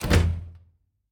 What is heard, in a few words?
train inventory pickup; factorio 2.0.55